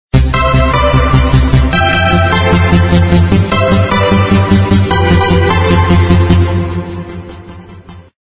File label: Ringtone